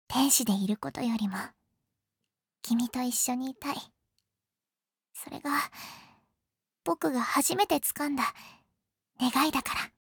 applause

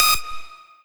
lead

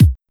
soft hitwhistle

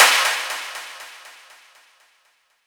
normal hit clap

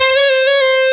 x x 14 bend half release

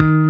bass

